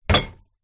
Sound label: household trashcan recycle glass bottle throw in on other rubbish